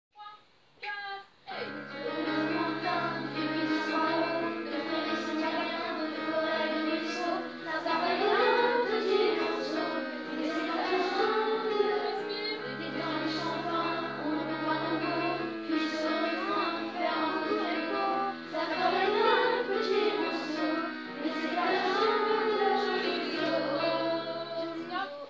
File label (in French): répétition chant, apprentissage du chant